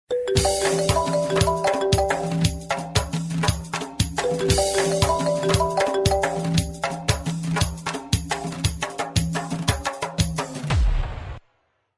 iPhone Remix Ringtone

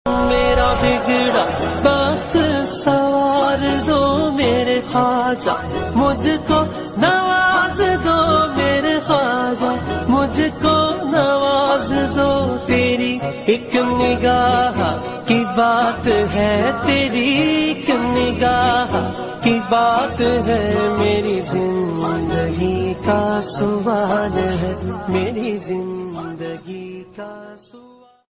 Islamic Qawwali Ringtone

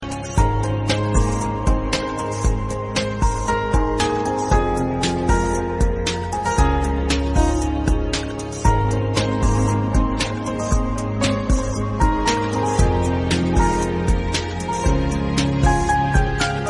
Instrumental Ringtones

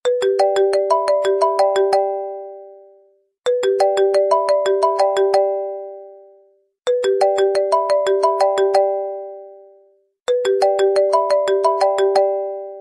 iPhone Ringtone

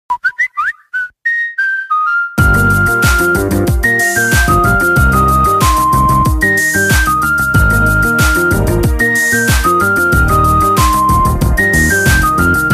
Samsung Ringtone; ringtones

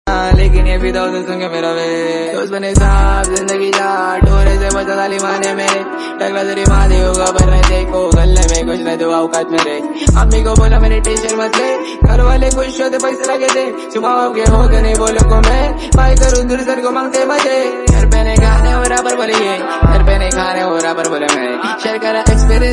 Song

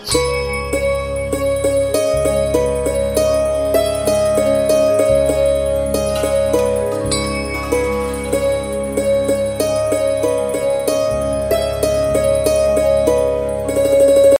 Romantic Instrumental Ringtone

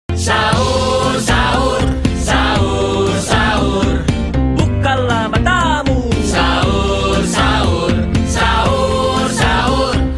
Sahur Sahur Ringtone

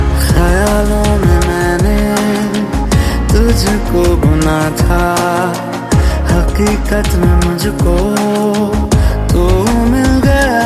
Bollywood Song Ringtone

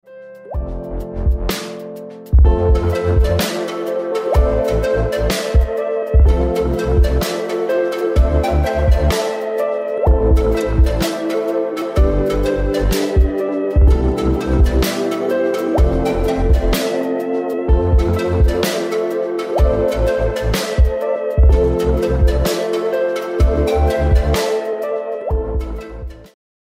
Romantic Mobile Ringtone; ringtones

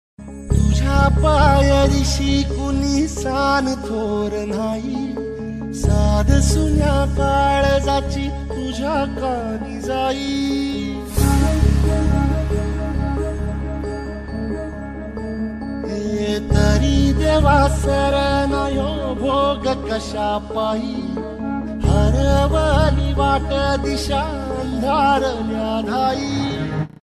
Marathi Serial Ringtone; ringtones

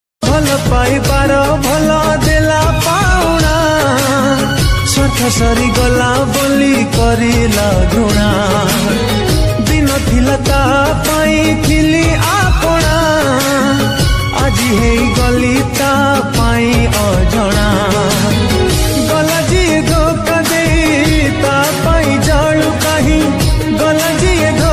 Odia Song Ringtone

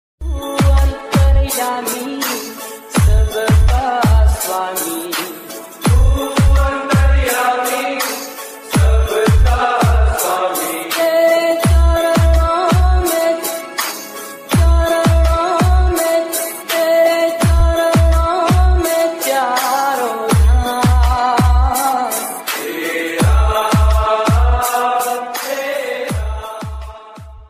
Mahashivratri Bhajan Ringtone; ringtones

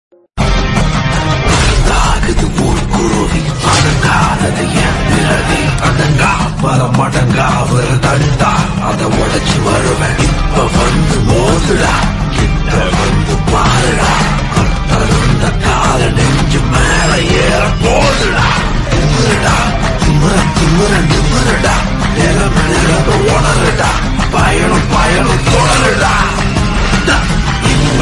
theme BGM Ringtone; Maara

